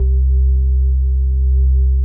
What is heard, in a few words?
HOLLOW ORG; Greytsounds; Scott Peers Sound Engineering